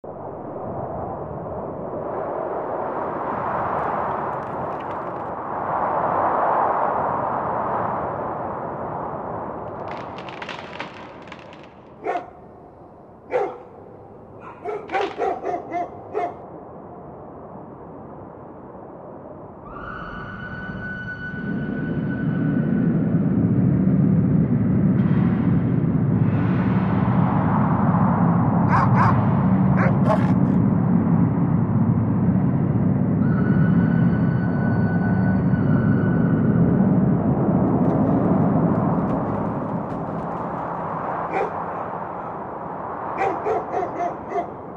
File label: Amb Park Area Ext